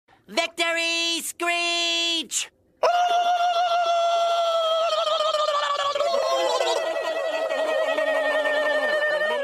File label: victory screech